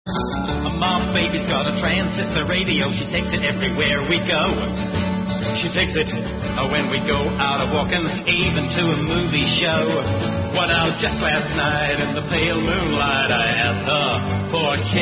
Transistor Radio

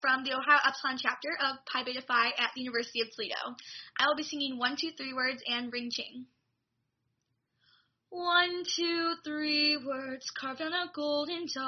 A Capella; A Capella Angel Audition; 1-2-3 Words And Ring Ching Ching

